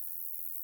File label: Buzz